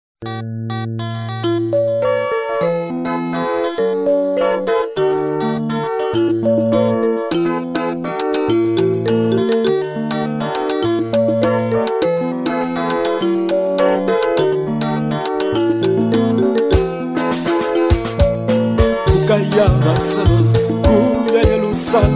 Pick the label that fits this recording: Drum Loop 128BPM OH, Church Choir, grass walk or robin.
Church Choir